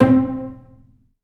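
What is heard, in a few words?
solo pizzicato, Strings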